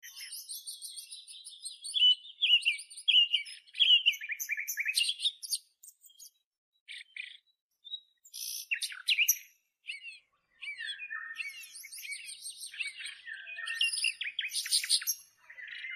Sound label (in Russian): drozd